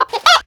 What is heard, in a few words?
comic chicken; soundscrate